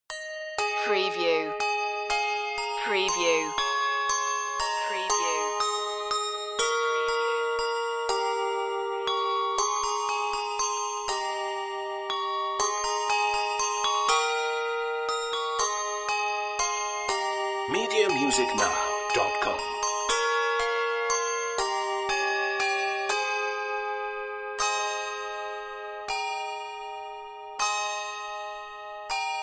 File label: handbells; wassail wassail